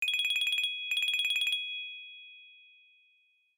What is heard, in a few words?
Ringtone UK Haptic, iOS Sounds